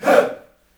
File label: hey, shout; Spectrasonics; Heart of Africa 1